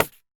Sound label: Pandeiro Slap; Best Service; Festa Latina VOL-1; Single-Hits